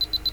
Mine; Risk of Rain

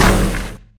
feral shoot; Risk of Rain

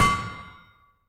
Crowbar; Risk of Rain

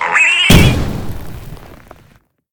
boar death; Risk of Rain

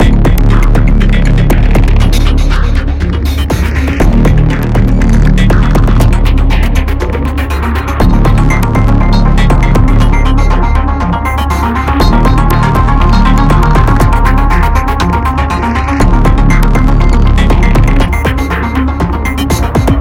music electro combat; synthetik

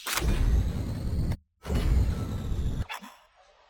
door open and close; HLD

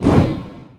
imp shoot; Risk of Rain

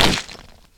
golem hit; Risk of Rain

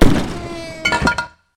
Wisp Death; Risk of Rain

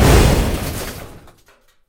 drone death; Risk of Rain

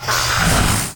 Lizard Death; Risk of Rain

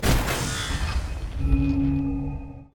archer death; HLD

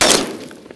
spider shoot; Risk of Rain

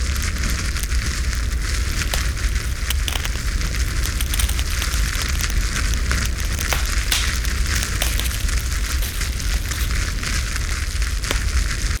pyro shoot; Risk of Rain

